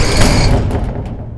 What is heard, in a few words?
crane magnet release; Counter Strike Source; vehicles